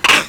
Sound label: Primate Bark